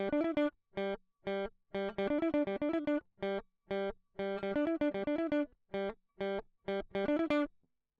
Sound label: electric